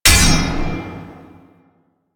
Parry Sword; Warframe; Teshin; Weapons

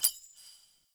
Shard Focused, UI; DE; Warframe; Helminth Shards

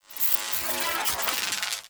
Shock Mote Strike Charge Up; Powers

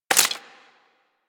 Bullet Impact Debris Wood

